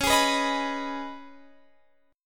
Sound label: Db 7th Suspended 2nd, Guitar, Open F; x,20,20,18,18,18; Db7sus2 strummed